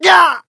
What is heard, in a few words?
death